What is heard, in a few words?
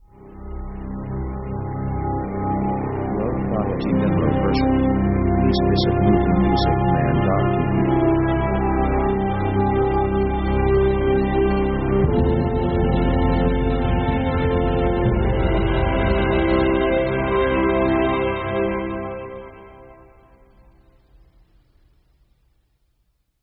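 orchestra cine intro, power; power themes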